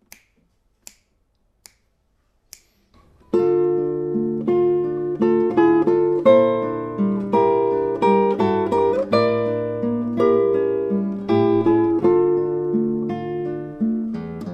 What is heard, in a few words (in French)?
milonga tierces; fin de la Milonga